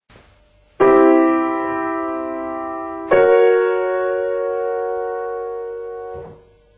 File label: pedal